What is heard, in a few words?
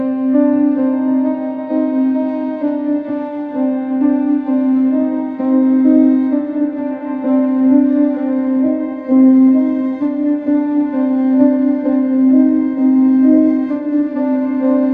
elegance in ivory and strings; ai